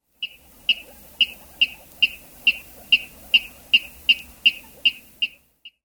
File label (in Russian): voda korotkii zvonkii blizkii